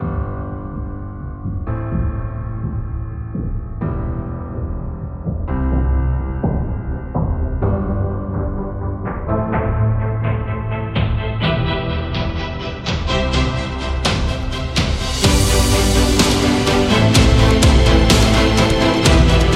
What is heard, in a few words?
Motivational Music